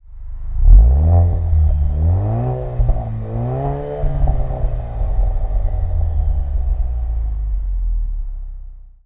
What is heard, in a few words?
Alfa Romeo Guilia Veloce REMUS Axle Back; Aerotechnik